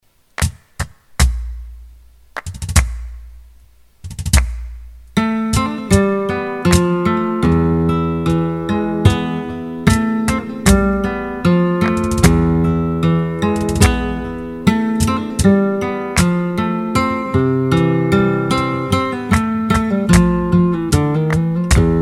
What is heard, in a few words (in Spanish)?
Soleares falseta